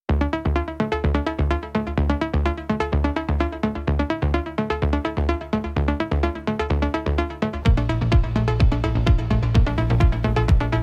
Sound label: Electronic Impulse